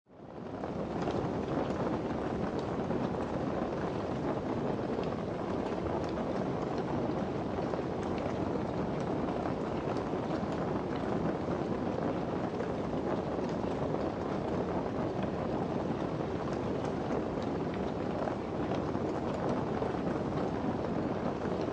Torch Flame Burn Constant Flicker, Subtle torch fire burning, small flame, flickering with sligh; Subtle torch fire burning, small flame, flickering with slight crackle, 2 minutes; Flame Sound Effects